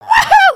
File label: excited, emotes